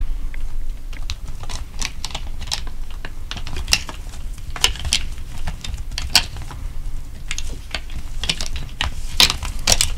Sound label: rattling deer call sound